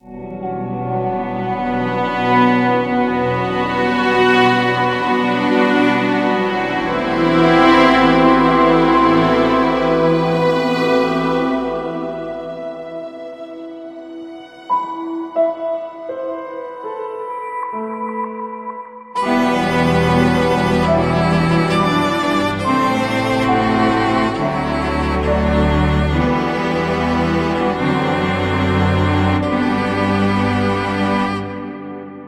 ee documentary soundtrack

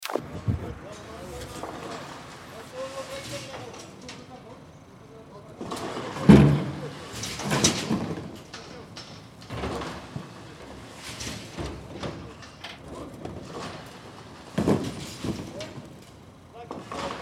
construction fieldrecording

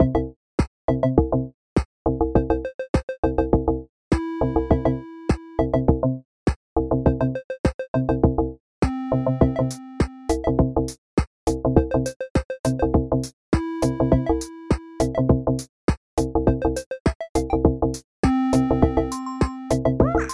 slow funk

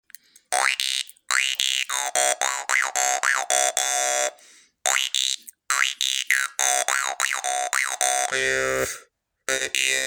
little jig

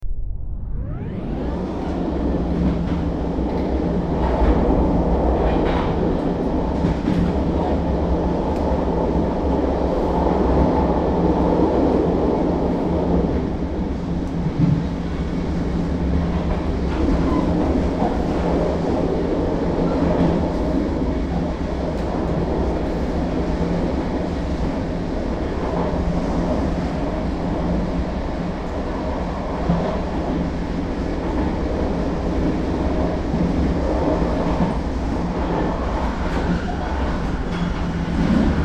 fieldrecording train screecher; weeklybeats-2020

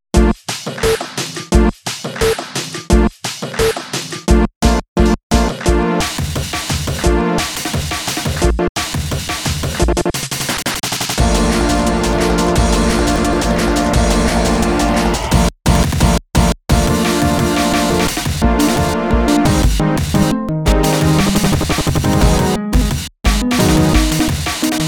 test beats

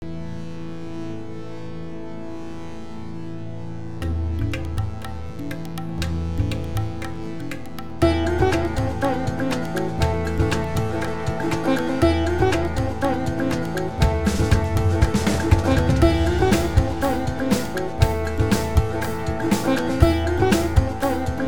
sitar

